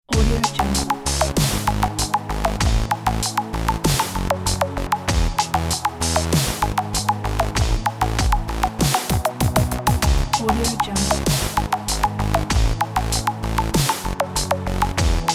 upbeat pop electronica

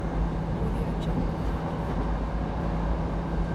old italian diesel train interior moving loop

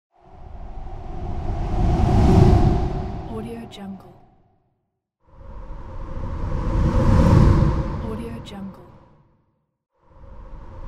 ghost fly by; tibasfx

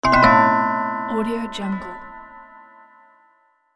alert sound with clock bells descending

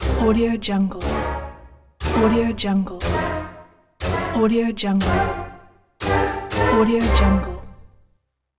orchestral comic tension logo; marburymedia

